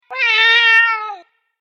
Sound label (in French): chat